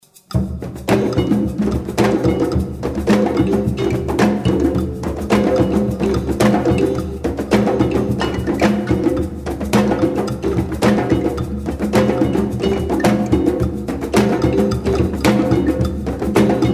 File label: funky